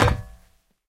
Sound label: beam land; Golden Crayon Sounds